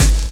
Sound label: DR. LOOP; X-Static Goldmine 3